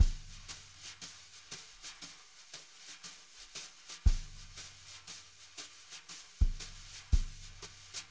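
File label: jazz brush, Drumscores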